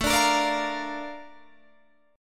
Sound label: Bb Major A, chord; Bb/A; strummed